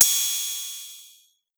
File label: Cymbal Ride, Boss Dr Rhythm110; Drums